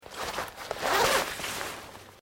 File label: JACKET SKI UNZIP, Movemement sounds for various articles of clothing; Skiing and Snowboarding